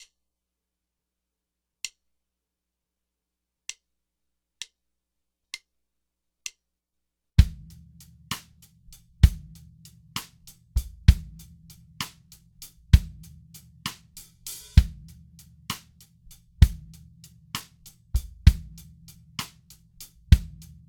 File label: Panning Test Drums